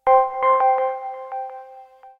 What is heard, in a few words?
alert